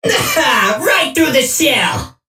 Rumble Hit Confirmation; World Of Warships; Entertainment

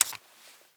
m92 clip out; Rust

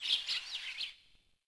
Forest Bird; Ambient; Civ4 warlords

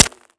asphalt small

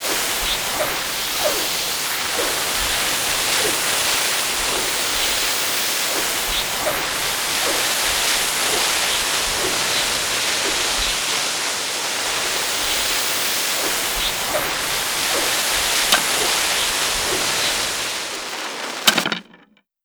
flare end flare; Call of Duty Modern Warfare

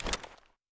juice carton pickup; Escape from Tarkov

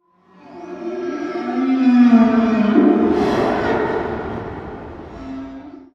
ambient battle; World Of Warships

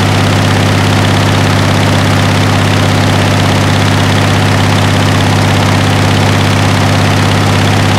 pt76 engine int rpm load, engines tanks; War Thunder

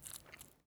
sprats food take; Escape from Tarkov

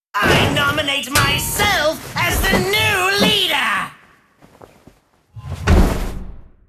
ambient port; World Of Warships; port3d

